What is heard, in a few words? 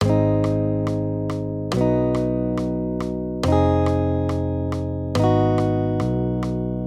maj p3, Major Triads, Root Movement Three Semitones, Guitar, Accompaniment; Ab-B